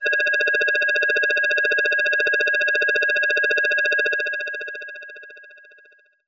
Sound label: Helicopter FX DX100 C6